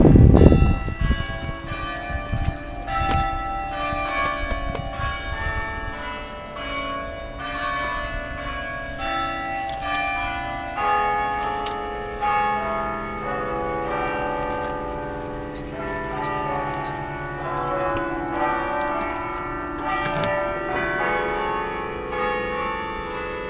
cathedral bells

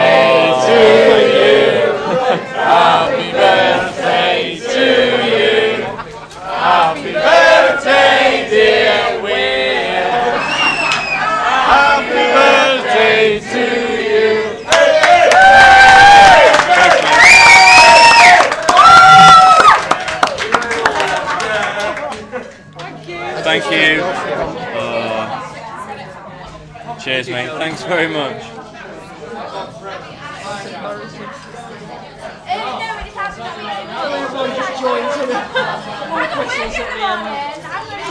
restaurant sings happy birthday to me

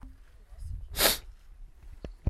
sniff

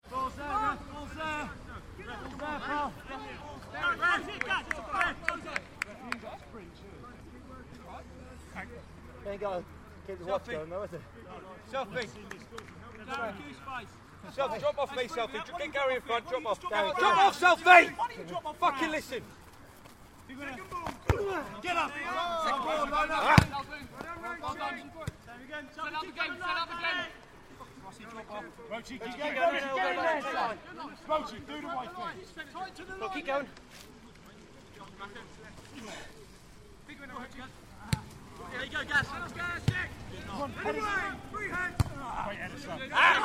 team noise; wroxham fc vs norwich utd

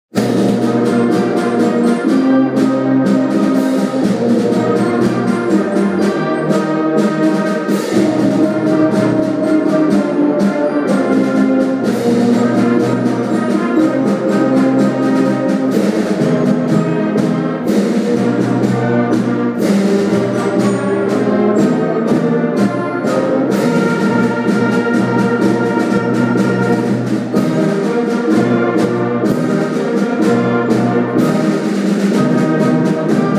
playing clarinet in band

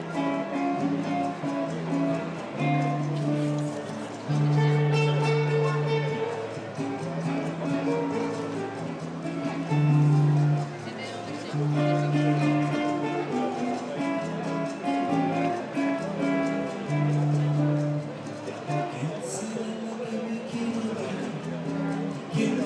mood music at the airport

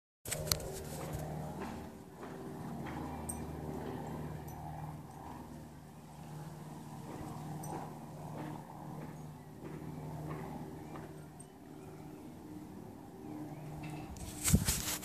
drone test or just a noisy bird; hampshire

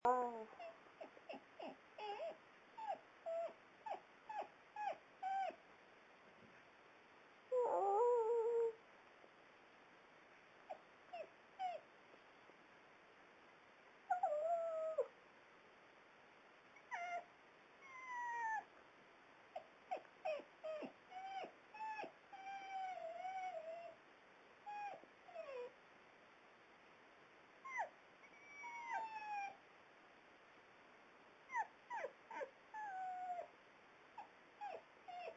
a conversation with my dog

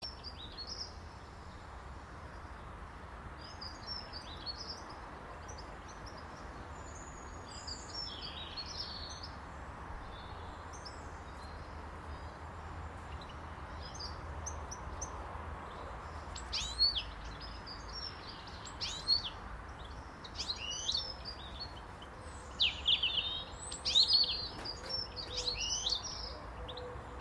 noisy back garden birds at lunchtime

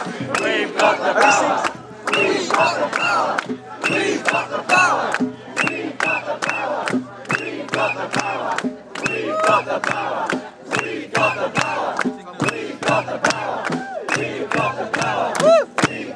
chants at balcombe fracking site

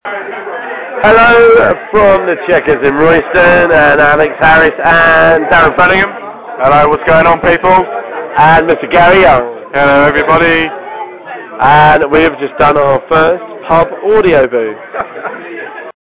pub boo

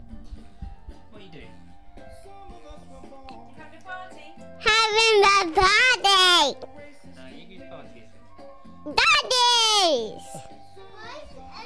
birthday with children

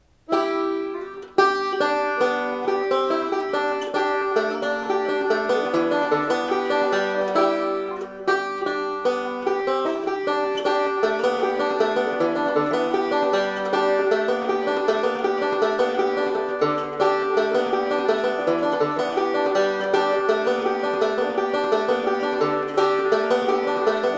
banjo

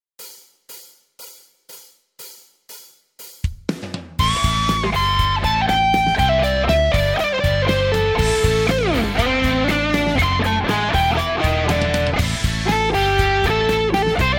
rocking out jammed

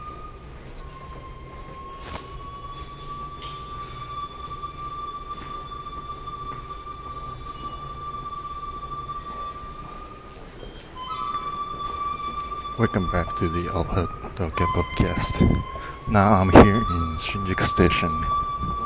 japanese instrumental fue sound shinjyuku; tokyo podcast

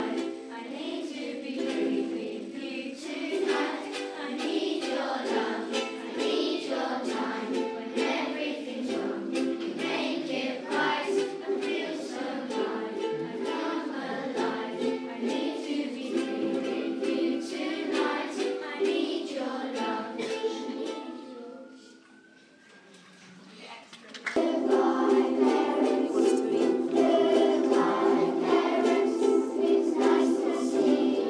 ukulele assembly

